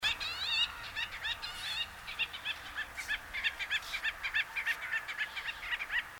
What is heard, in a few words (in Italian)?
Sterna zampenere Gelochelidon nilotica